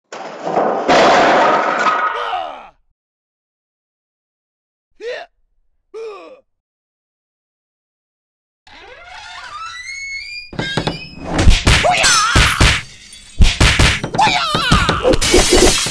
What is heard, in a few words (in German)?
Schlaegerei